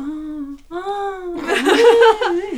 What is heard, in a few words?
NOISE, CLASSIC VOX; Best Service; Hallelujah